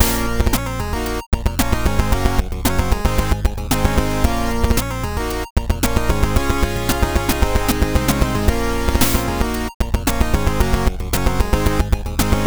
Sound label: boss battle retro